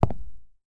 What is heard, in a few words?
Footsteps Concrete Shoes; FX Oneshot